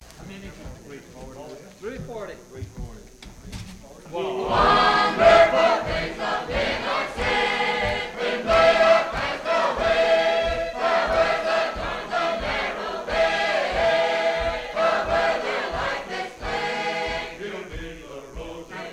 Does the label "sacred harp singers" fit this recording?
Yes